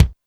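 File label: kick Tite, Alesis D4; 300 Drum Machines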